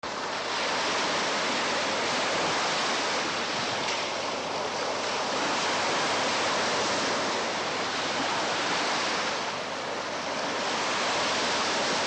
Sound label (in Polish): morze